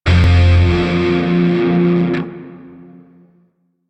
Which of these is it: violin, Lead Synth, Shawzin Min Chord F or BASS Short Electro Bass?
Shawzin Min Chord F